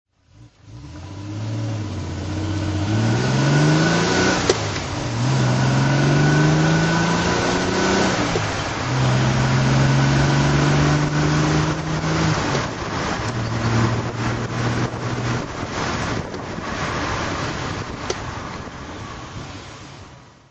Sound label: tr250 drive